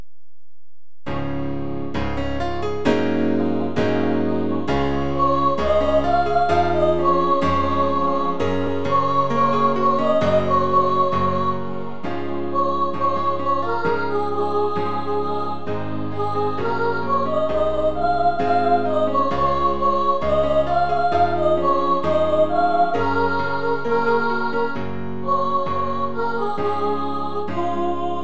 light solo, choir

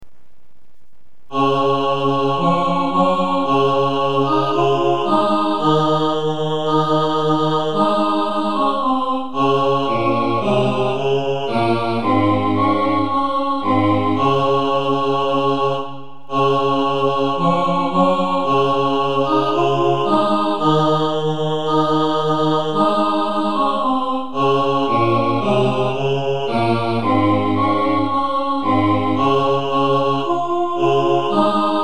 alto bass, choir

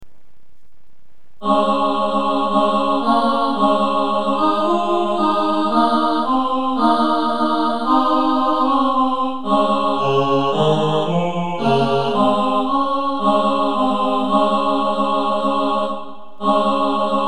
alto tenor

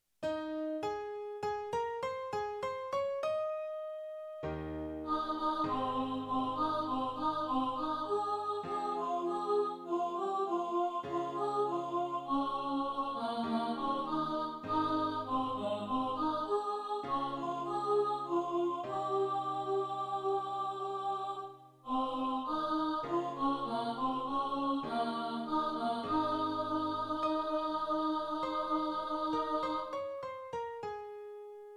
alto

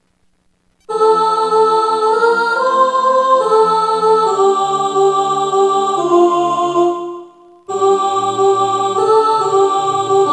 Bleak Midwinter Soprano, choir

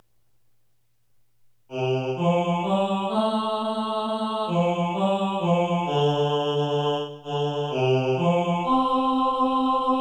my life tenor, choir

